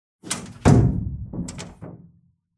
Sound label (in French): Fermeture porte